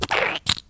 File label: Muddy Boots